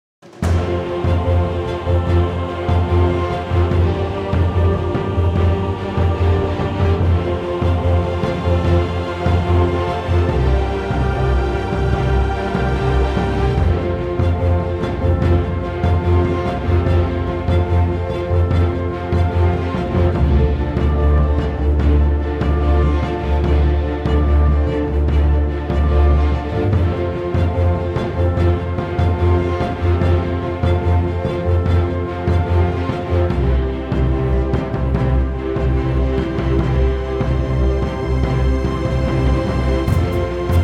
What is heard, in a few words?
F orchestra; Epic Orchestra; key - F - vocal range - G to C; Here's a very Epic orchestral arrangement